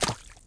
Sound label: creature footsteps puddle; med creature